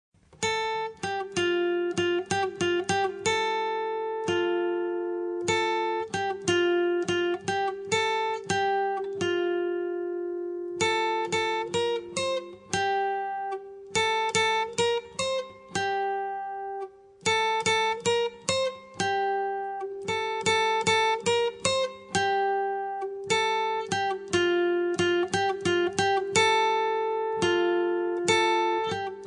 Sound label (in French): fais dodo guitare